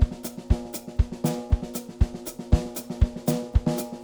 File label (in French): 4 micros groove 8; gc proche peau; audioctone km-8p